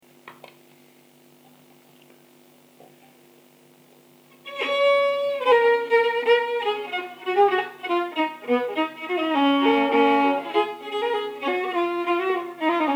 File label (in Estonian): polka, viiul, folk; Bens Hennas polka; Bensi talu Henna; Ruhnu